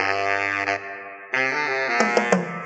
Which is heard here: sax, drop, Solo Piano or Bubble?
sax